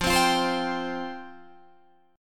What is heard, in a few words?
F Suspended 2nd F Guitar DADGAD